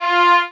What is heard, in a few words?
violin